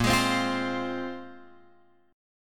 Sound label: Bb 7th Suspended 2nd, Guitar, Open F, chord; Bb7sus2; strummed; x,5,8,7,8,7; JGuitar